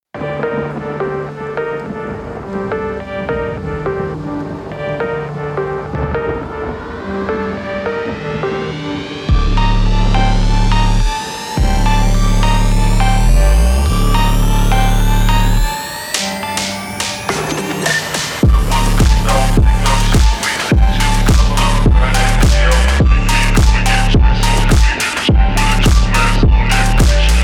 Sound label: rainstorm phonk